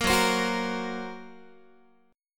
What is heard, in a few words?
F sharp Major Flat 5th F sharp Guitar; F#Mb5 strummed; 13,14,15,16,14,x